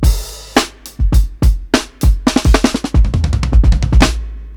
105 BIG FILL, DRUMS LOOPS; BASEMENT DRUMS VOL 2

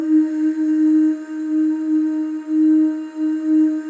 OO, FEM OOS, Voices; Symphony of Voices; Spectrasonics; FEM.2 OOS

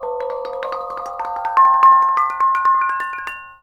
KONGWONG; Spectrasonics; Heart of Asia; KONGWONG PH

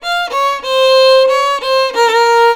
VIOLON B, Indian Traditions; USB Soundscan vol.45; AKAI

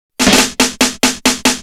Fill, Snare Fills

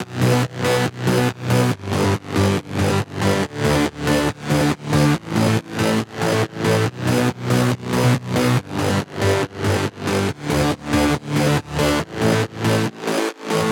140BPM B; Vengeance - Essential Clubsounds Vol.4

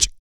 BASS BOMB; Blues Soul; Spectrasonics Vocal Planet

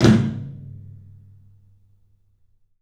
TOM, 3D Drums, CLUB TOM; 3D Sound; CLUB 12 TOM

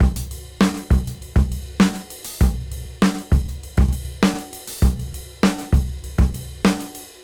KL Beat 98 BPM; AMG - Keith LeBlanc Phat Butt Jams

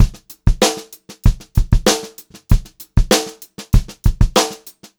POP VARI, Pop-Rock Drumloops; USB Soundscan vol.37; AKAI; 96 POP

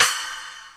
Korg N5 Rock Kit C#3

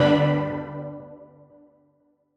Old Skool G2

